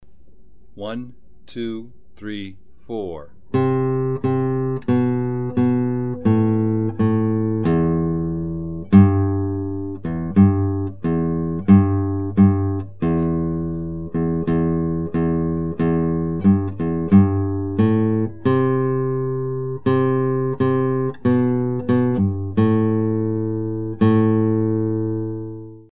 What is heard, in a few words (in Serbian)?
Johnny Has Gone for a soldier, Gitara